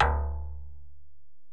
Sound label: Bell, Alesis DM-PRO